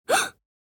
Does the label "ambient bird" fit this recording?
No